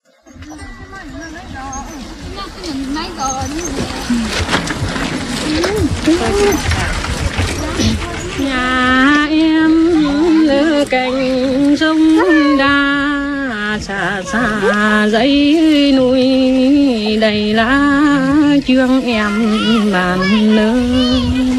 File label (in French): Chanson vietnamienne